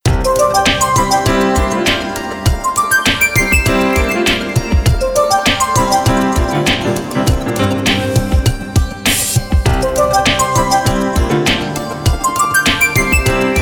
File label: Tune Theme, ringtones; Meizu MX2; Flyme